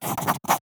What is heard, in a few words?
paper write